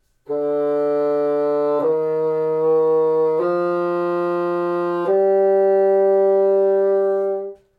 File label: bassoon